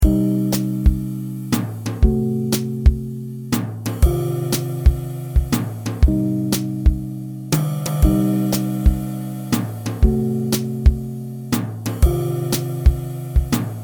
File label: smooth jazz